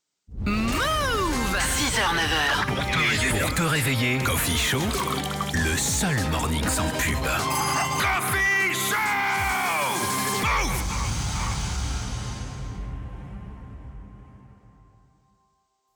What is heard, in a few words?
JINGLE INTRO GENERIQUE; COFFEE SHOW